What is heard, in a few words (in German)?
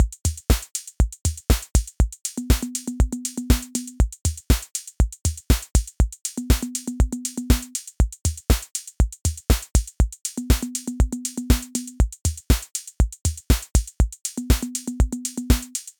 Analoger Drumcomputer